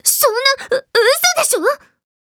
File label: failsound; BurningCrow-V2